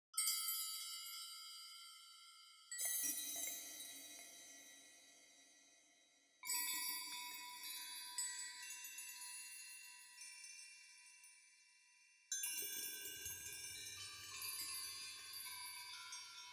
peak shimmer

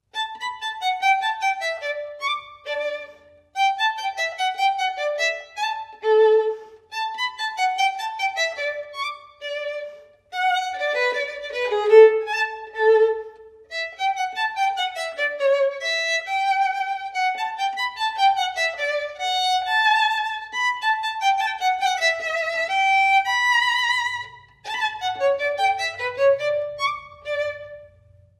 Bach Gavotte

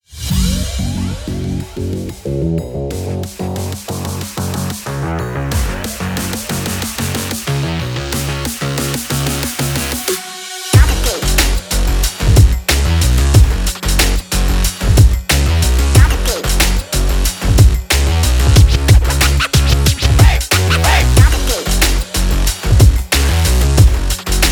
Racing Energy Breakbeat; quick race linux macos ps4 switch windows gamerip 2021

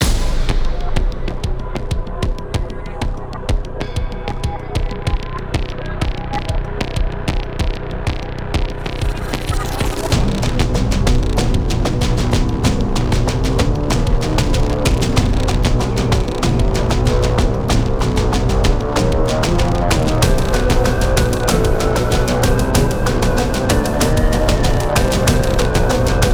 music level music; rogue stormers

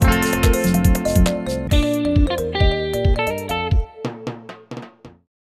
Boot Sound; jett tailfin; wii u

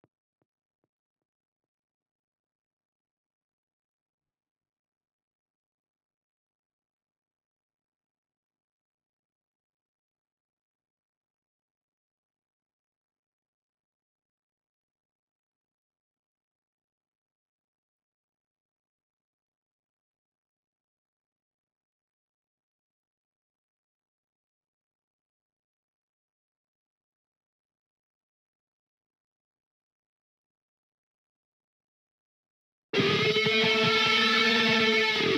Leads; risk of rain 2 engineer edition 2

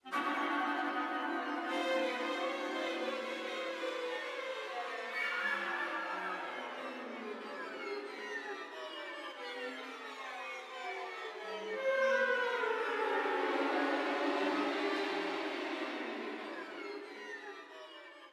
Combat Orch Effects Stem; Necropolis

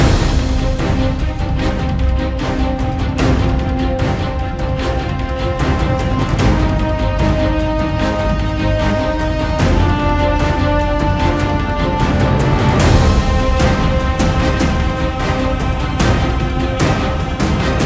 Main Menu Loop; Green Lantern Rise of the Manhunters; 3ds gamerip 2011

